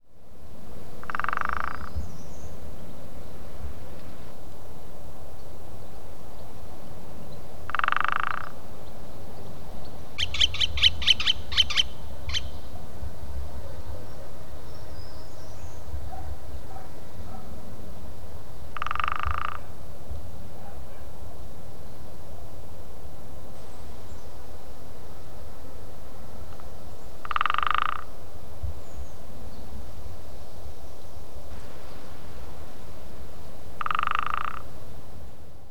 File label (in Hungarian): balkáni fakopáncs dob